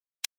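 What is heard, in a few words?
toggle on, A toggle switch with distinct on/off sounds.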